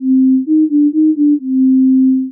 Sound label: Sine; SoundGen